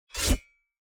crusader strike sword

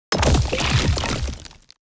building destruction build

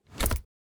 ui submenu close

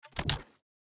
submenu dig open

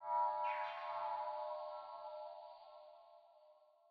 ambience room necromastery magic layer

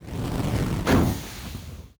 npc fire golem strike

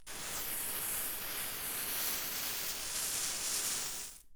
ambience room forge working layer

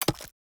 ui click menu

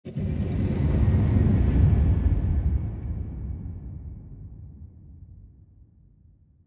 ambience arena stone

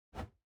mobs jump

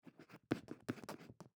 ambience room shadow room working layer

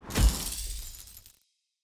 ui spelldraw correct

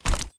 ui submenu open; Shelter

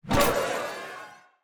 ui riot begin; Shelter

